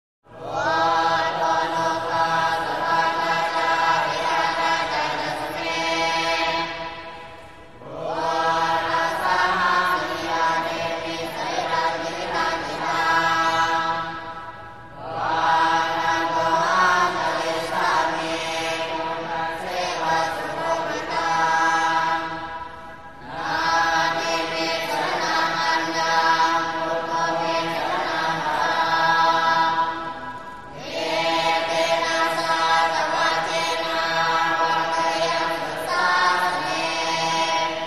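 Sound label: Chiang Mai, Thailand - Child Novice Thai Monks - Large Group Chanting, Singing, Interior Of Large Temple- Musical, Asian; choirs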